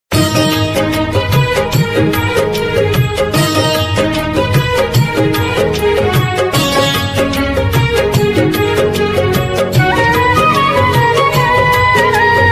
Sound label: odia ringtone; odia jay jagannath ringtone; odia music 2023 viral